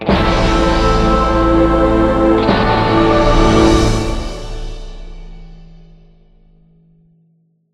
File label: Western Enemy Disappear Stinger